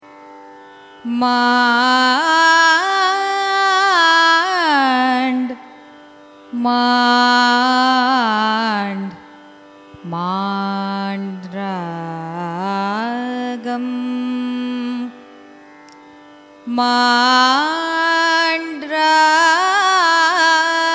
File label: raga maand signature, carnatic music